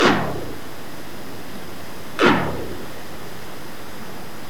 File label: animal